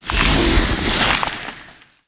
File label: skill snake